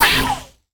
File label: hit; toxifin